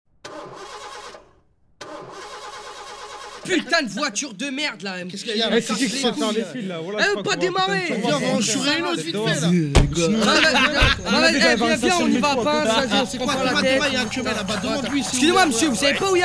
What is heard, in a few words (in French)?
Intro Tribal Poursuite